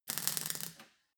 weld sizzle short

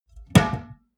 glass door small close hard

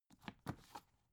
dvd case pick up

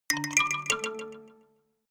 notification kalimba delay triad up major

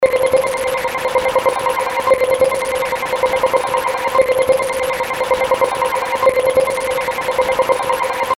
ambient deep seq

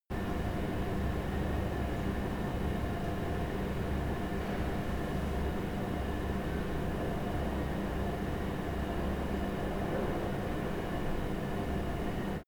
machine room spinning buzz

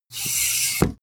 pneumatic open

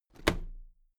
toolbox close drop lid regular

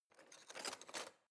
knife wood drawer grab soft

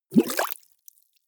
bubbles hard

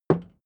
hammer wood

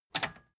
metal button tiny click disable fast

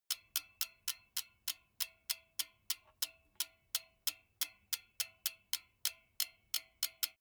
bmx bike freewheel click

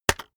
keyboard external enter hit fast

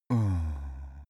cartoon male pity

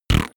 fart deep

